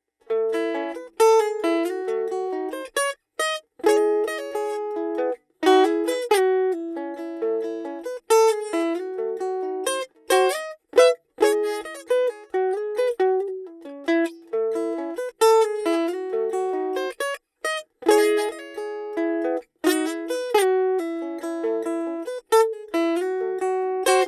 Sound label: Mandolin Gibson, Neumann M147